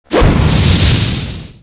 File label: Knight Skill